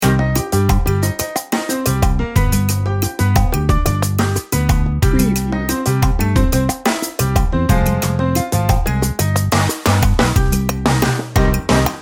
salsa party loop

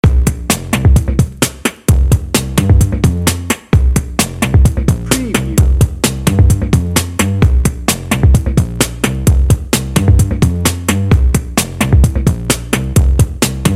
bouncy hip hop dnb

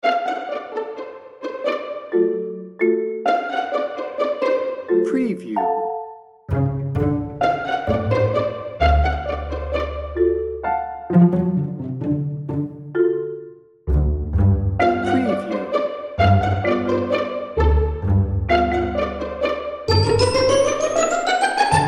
playful pizzicato no percussion

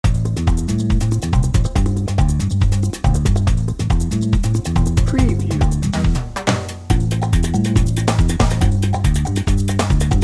salsa merengue madness dnb

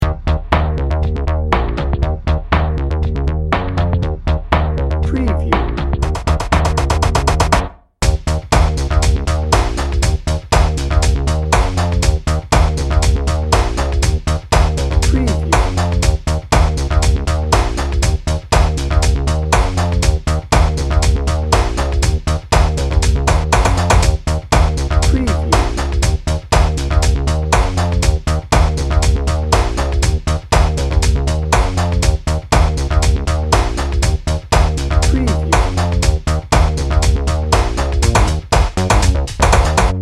pulsing moonbeams dnb

